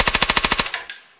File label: silencer burst